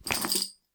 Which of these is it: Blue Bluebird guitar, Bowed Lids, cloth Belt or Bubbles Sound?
cloth Belt